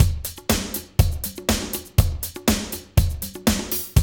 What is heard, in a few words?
Loop, Roland; Sound & Vision - Gigapack I